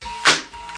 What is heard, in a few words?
tone clap tone; FILLS and ROLLS